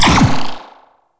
Drone Fire; Super Drone Attack Osprey

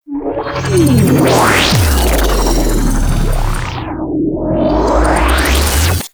Portal Enter; Warframe; Dark Sector

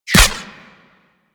Ghost Expel Spirit Impact; Warframe; Priest Quest; Rell Ghost

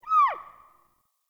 Trapped Fawn Call; Encounters; Animal Rescue

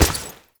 Step Default

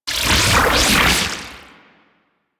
Pirate Cannon Cast; Warframe; Warframes

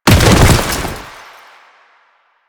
Bodyfall Default; Warframe; Lasrian; Lasrian Soldiers; Foley; Body Falls

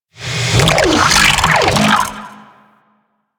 Sentient Vocal Attack; Warframe; Sentients; Dolicholyst

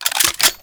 Four Barrel Rifle Reload

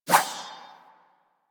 Rapier Whoosh; Swords

